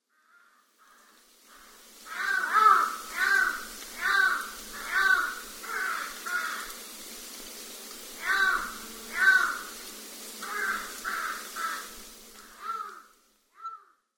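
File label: large-billed crow, naha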